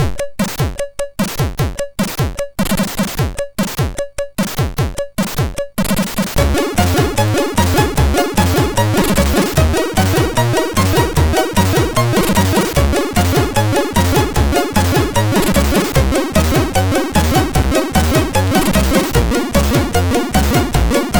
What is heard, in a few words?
Happy Again, MOS6581R3